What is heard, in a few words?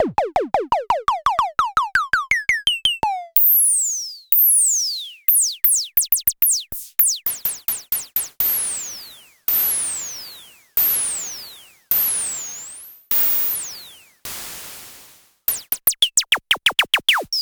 Klone Dual Percussion Synthesiser pitch